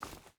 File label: footstep hide forest walk; Rust